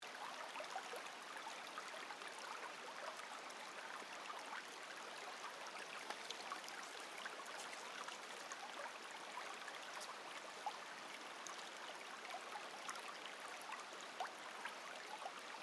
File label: Brook gentle